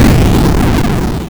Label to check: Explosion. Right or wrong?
Right